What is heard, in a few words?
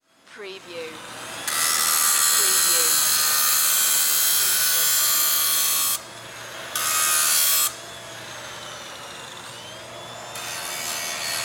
Table Grinder On Run